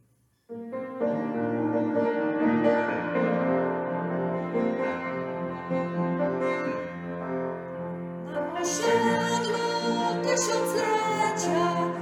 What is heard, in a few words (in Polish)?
Hymn szkoły